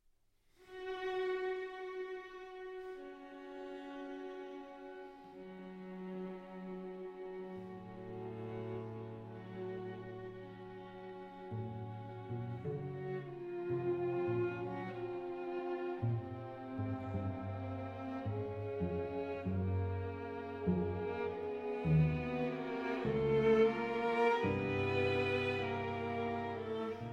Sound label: String Quartet